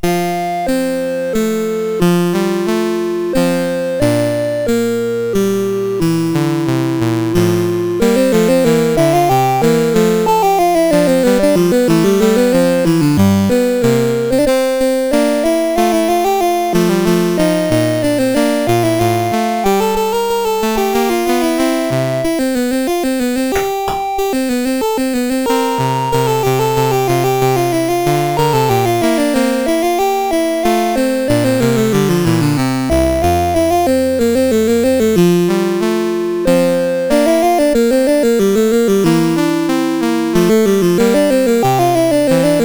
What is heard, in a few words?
Adagio Sonata in F Major, CSG 8580; CSG8580R5